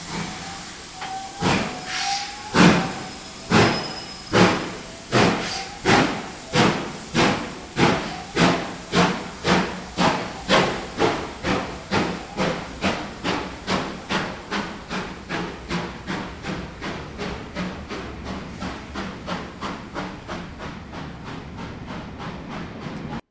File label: start, locomotive